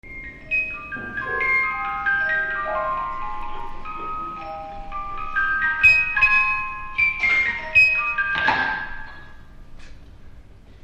music for piano

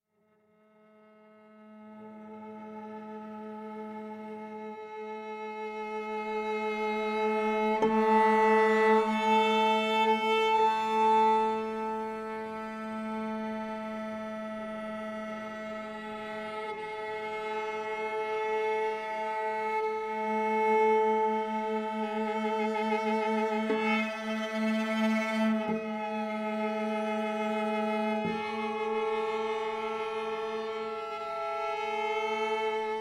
Duo for Violin and Cello

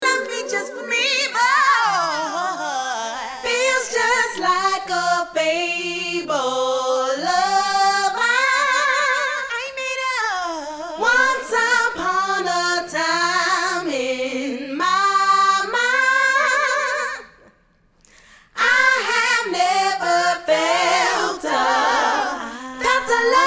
acapella

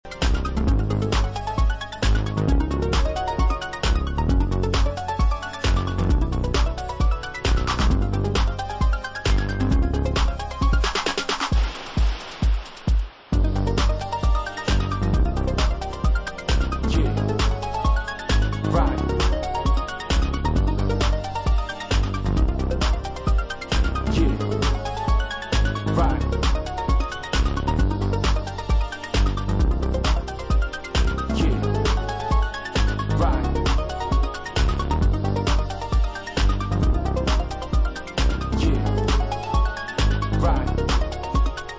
arp surface

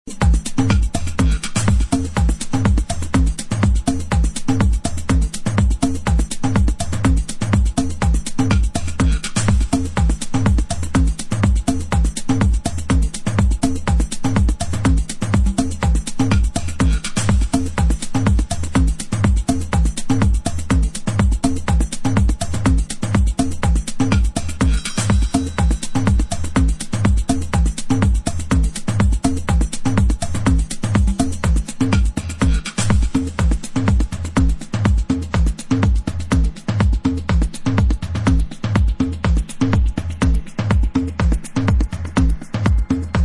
drum dub

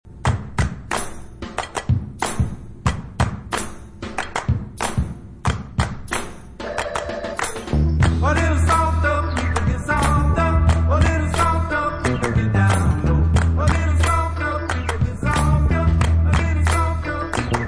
Hand Clapping Song

